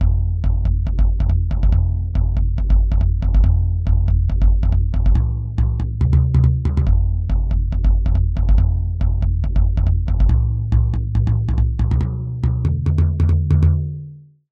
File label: subboombass sequenz; rob papen testbericht; fame drummer